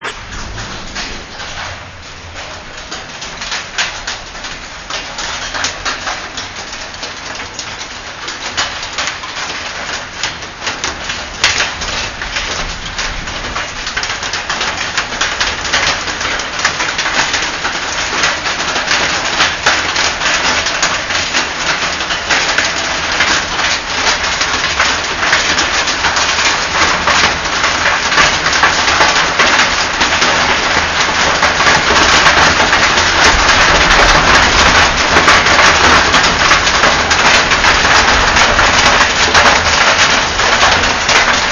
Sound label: rain in Katmandu; It rained daily